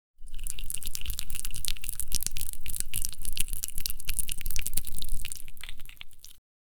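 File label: sizzling dough soft puffing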